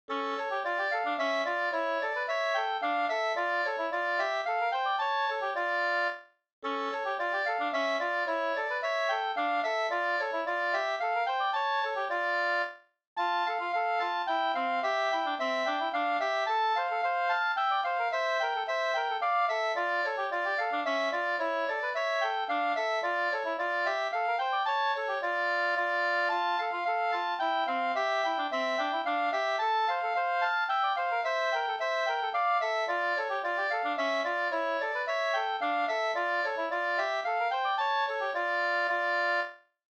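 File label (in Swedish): slangpolska, two-part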